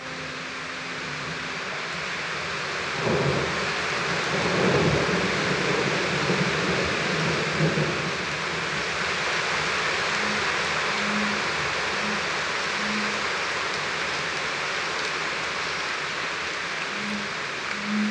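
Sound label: Atlantic, San Pedro, after rain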